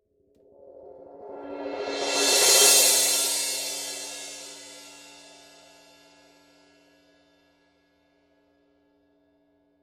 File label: 18 heavy crash roll, paiste; pst 7